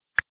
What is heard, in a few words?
keypad; Motorola WX160